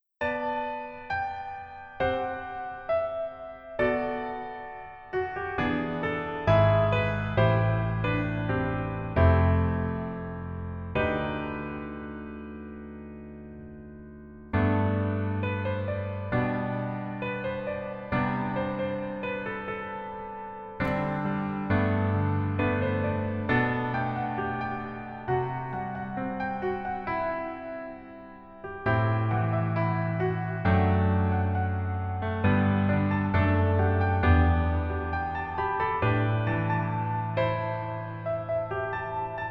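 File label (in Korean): Piano version 멜로디MR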